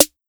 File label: Snare